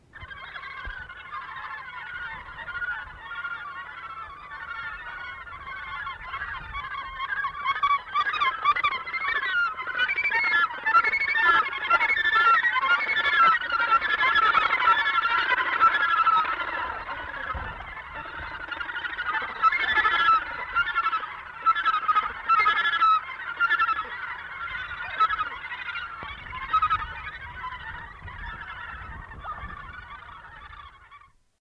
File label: Australasian Gannet